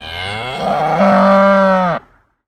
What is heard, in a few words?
Cow Moo